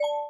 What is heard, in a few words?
alert